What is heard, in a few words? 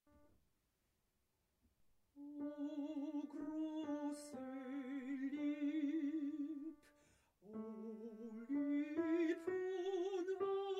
alto